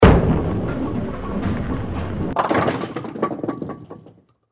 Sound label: bowling